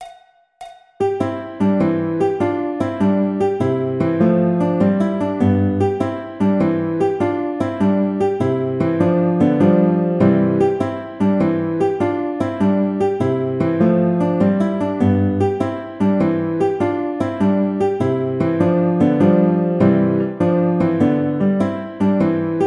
Andante Guitar, classical

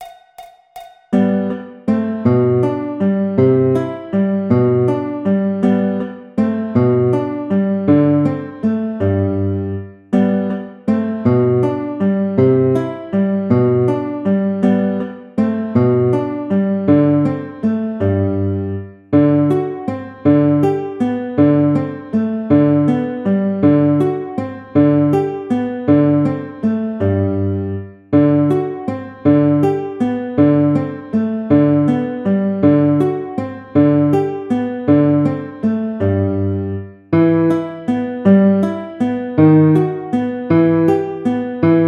Waltz Guitar; classical

